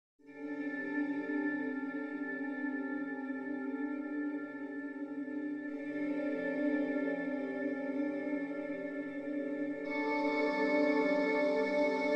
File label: Textures Harmonic Resonances